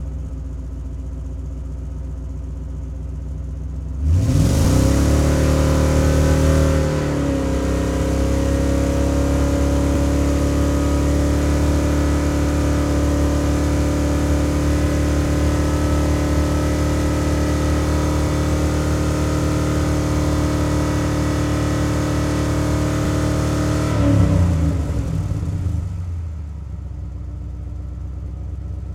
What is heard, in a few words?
Truck Explorer Fast Idle Off; Trucks